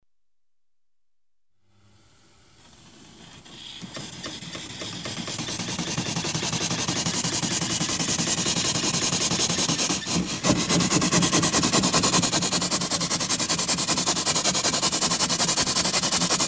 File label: Steam Launch pumping water onto boiler; The BBC Sound Effects Library